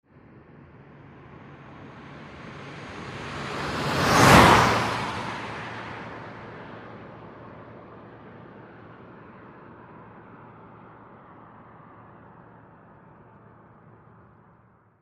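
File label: car passing by fast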